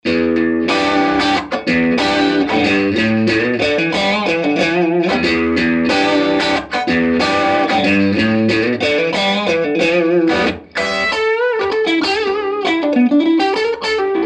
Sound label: crunch middle bridge; schwarzbach